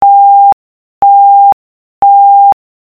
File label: Tone